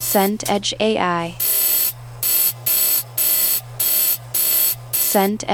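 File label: ambience waiting room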